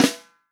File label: FUNK SNARE CHROMATIC, REAL DRUMS